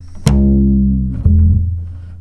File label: Stick Bass F; Total Drum&Bass